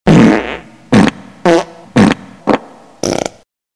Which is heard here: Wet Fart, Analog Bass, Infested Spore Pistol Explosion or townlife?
Wet Fart